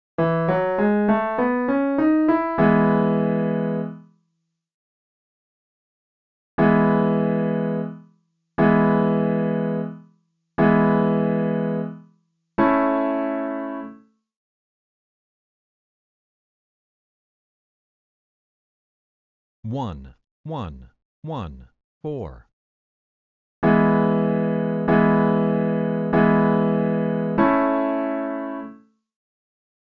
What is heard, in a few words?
I, IV, progressions; easy